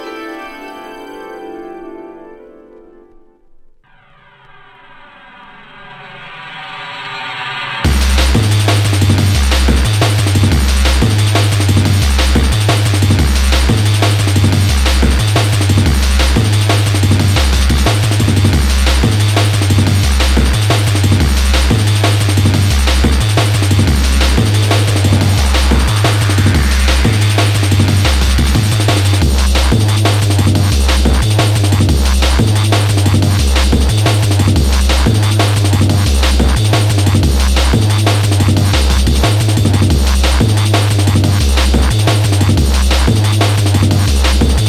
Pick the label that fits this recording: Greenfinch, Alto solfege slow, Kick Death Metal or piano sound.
piano sound